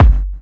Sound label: Kik; Rawcutz - Nu Forms